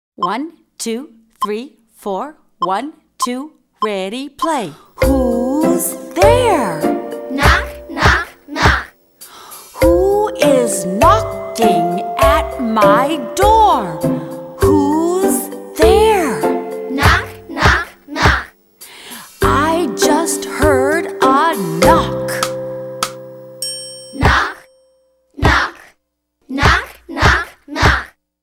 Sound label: knock knock